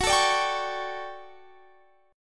D Major 11th F sharp, chord

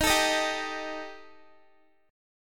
D sharp Major Flat 5th, Guitar; strummed

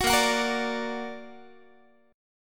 Bb Augmented Bb, Guitar; strummed; 21,18,21,20,18,x

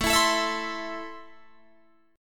A Minor, Guitar, Open D

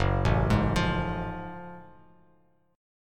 F sharp Minor Major 9th, Bass Standard; arpeggiated

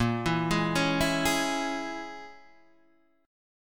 A sharp Minor 7th Flat 5th A sharp, Guitar DADGAD

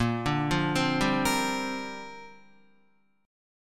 A sharp 6th Add 9th, Guitar; A#6add9 arpeggiated; JGuitar

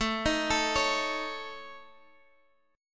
A Major Flat 5th, Guitar Standard; arpeggiated

